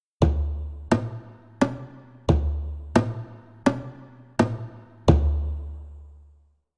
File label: (Drums)